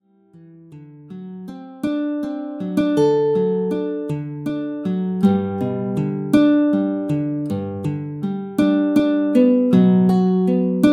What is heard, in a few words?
synth